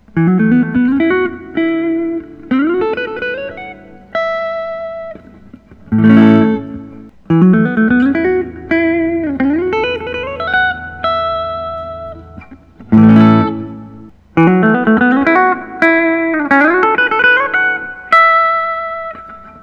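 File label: collings i 35 candy apple red; throbaks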